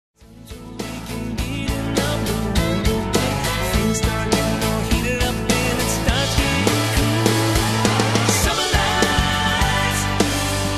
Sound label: karaoke